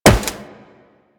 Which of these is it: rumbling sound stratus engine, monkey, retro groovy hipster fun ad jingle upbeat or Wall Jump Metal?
Wall Jump Metal